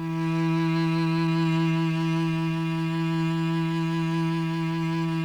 CHAMB CELLO F3; Sonic Reality; Vintage Timetraveler

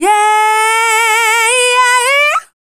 Dance Vocals, FEMALE

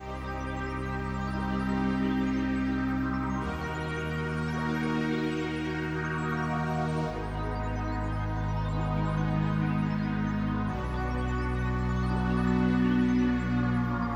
String Riff C Eb Ab B Bb, 132bpm; Big Fish Audio; Marshmellow; Construction Kits

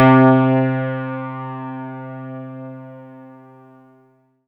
C3, SynthLine; NiceBeats

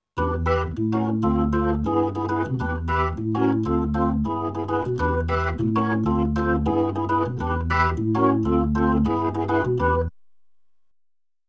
B3 Organ; Masterbits CLIMAX 8

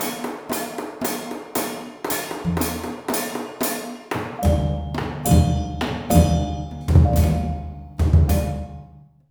MALAY PHR L, Heart of Asia; Spectrasonics - Heart of Asia CD2

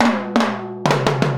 Timbale Fill; Sonic Foundry (Sony Creative Software) - Essential Percussion

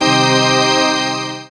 SYN HUGE, dance; Sound & Vision - Gigapack II CD 1

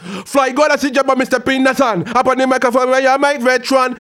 HAL RAGGAM, RAGGA-MUFF C maj; Sound & Vision - Gigapack II

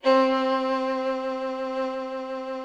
VIOLIN C3; VIOLIN+VIOLA; Greytsounds VOL-1

